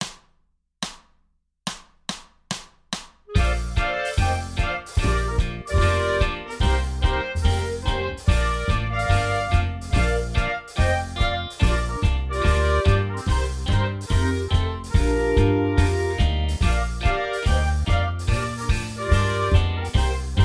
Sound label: Rag 144 bpm